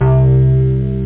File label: juno synth; boombox demo